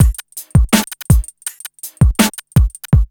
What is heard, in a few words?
beat, Hip Hop R n B; USB Soundscan vol.19; AKAI